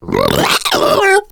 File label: female alert; boomer